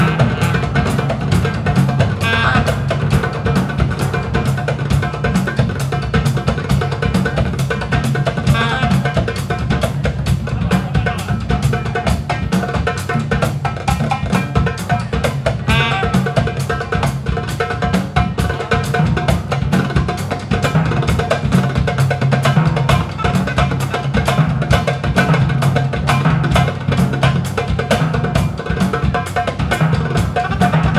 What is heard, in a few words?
DRUM POWER BAND; 30 sek.